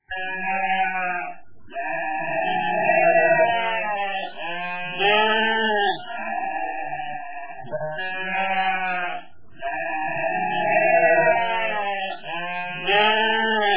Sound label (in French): mouton